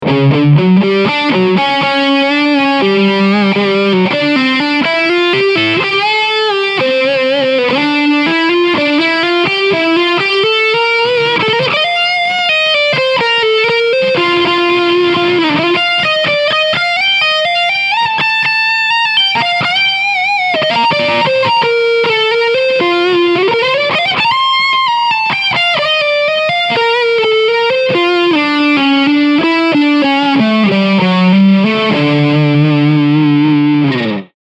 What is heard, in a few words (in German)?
Leadsound EQ 12 Uhr